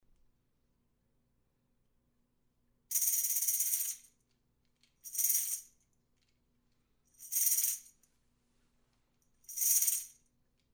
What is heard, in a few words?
large tamb roll